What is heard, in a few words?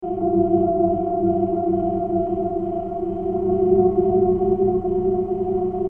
energy warmup; abilities